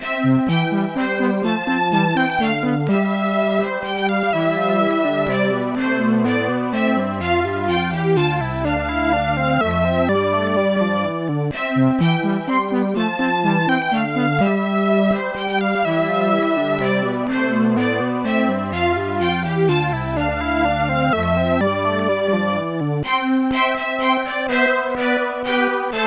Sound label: synthetic quartet